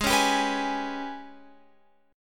Ab Minor Major 7th Double Flat 5th, down, Guitar, Open F; strummed; AbmM7bb5